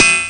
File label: metal